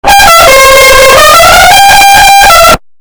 car loud

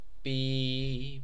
bip long

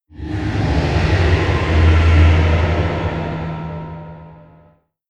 roar distant; alatreon

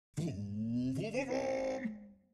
vroooom; esquie

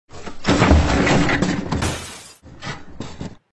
object falling and breaking

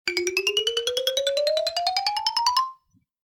circus cartoon running

